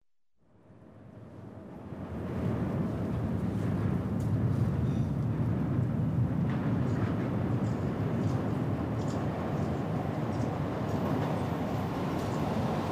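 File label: metro station arrival and departure of a train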